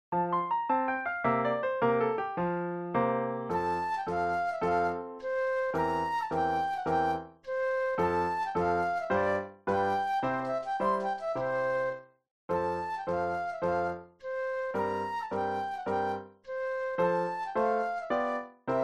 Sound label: Flute Solo; classical